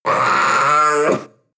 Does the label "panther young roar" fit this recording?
Yes